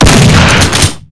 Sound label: benelli fire; firearms